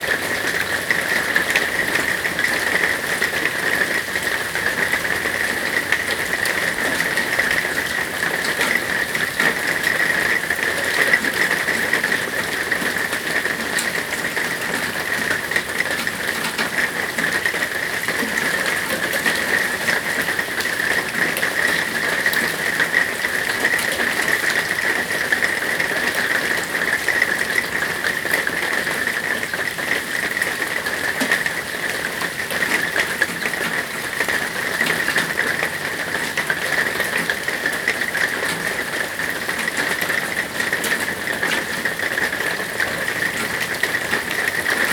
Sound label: Heavy Night Rain